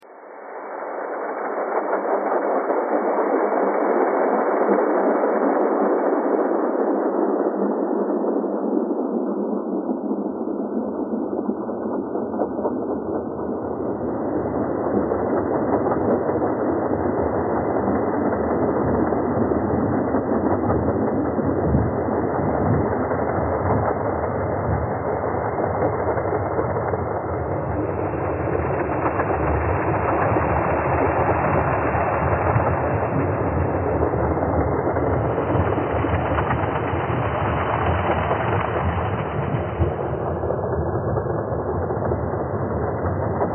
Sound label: train thread all